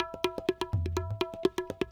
PERC LOOP ETHNO 125

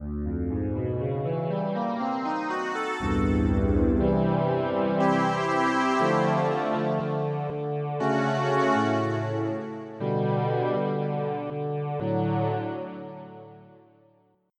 Metal Pad; EverQuest

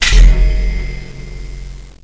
xray on

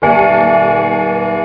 Chime; Spy!™ v1.0

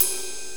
ride cymbal; gmplay; drums

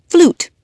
flute

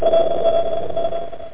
Sonar

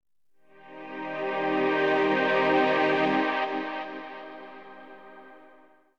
SYNTH PAD AMBNT 160 C, Ambient; eJay; Sphere

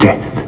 DEATH

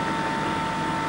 FAN; Rapid Gun; ipsc

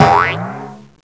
bounce; McDonaldland - Silly Games; McDonald's

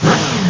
Chew; Scorched Tanks

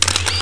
KLICK

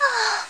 MOAN

